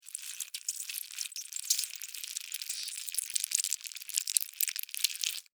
Larva Maggot Move, Larva, Maggot, Movement, Slimy, Insect, Bug; Larvae; Blastwave; Animals:Insects